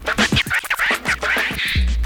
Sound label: scratch